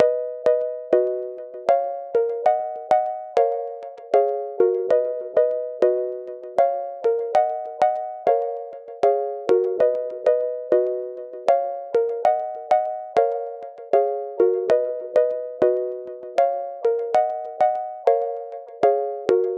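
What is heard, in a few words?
Pluck Loops G minor 98 BPM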